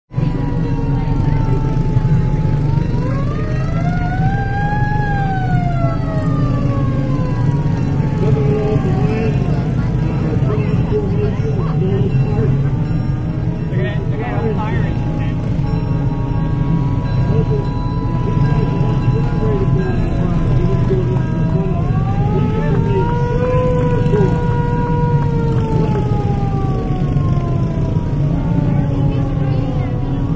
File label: Classic fire truck at a small town parade.; Parades